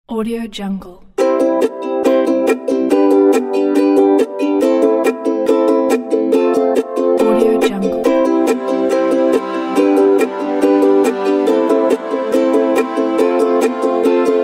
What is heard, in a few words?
corporate ukulele